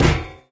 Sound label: physics turret impact sm